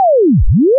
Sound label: crash descend